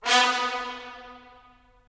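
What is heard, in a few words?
brass trumpets staccato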